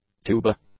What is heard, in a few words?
tuba